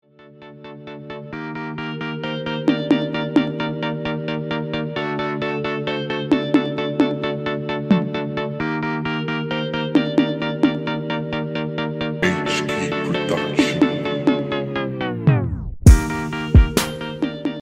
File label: Instrumental